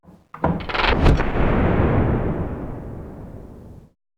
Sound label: Whoosh Experimental Thunder Roll Off; 2496SoundEffects; Whoosh Pack 1